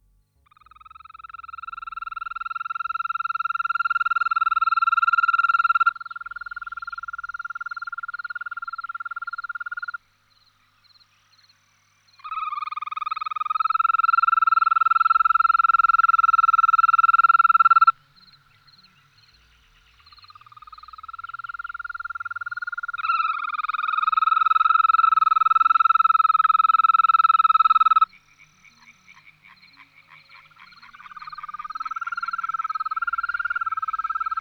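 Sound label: summer night concert nocturne of green toads and forest frogs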